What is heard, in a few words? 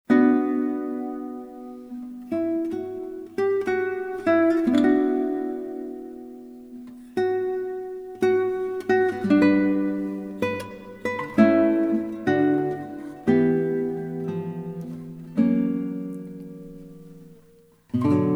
Solo Guitar